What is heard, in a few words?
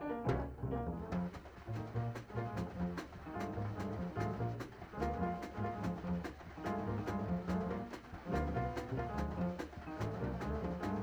Blues before